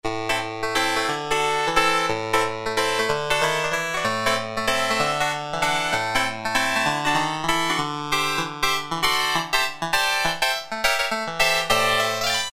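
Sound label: Harpsi, Roland MKS-50 harpsichord; Factory examples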